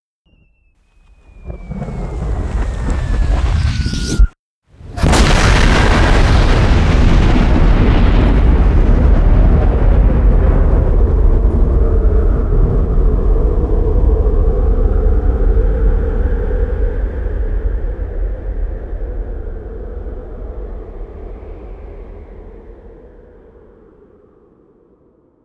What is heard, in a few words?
Ultimate Bomb FX; Звуковой эффект Hardstyle; FX Loop; 140BPM; бомба; взрыв; звуковые эффекты